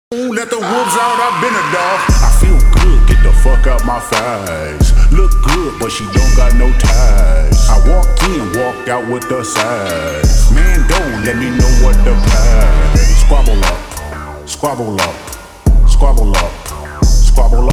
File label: Slowed Reverb